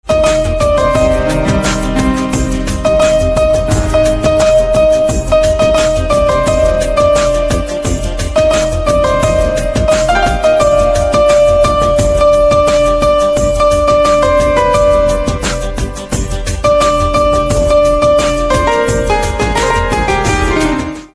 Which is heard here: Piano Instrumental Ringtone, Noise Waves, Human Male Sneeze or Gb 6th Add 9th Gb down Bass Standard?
Piano Instrumental Ringtone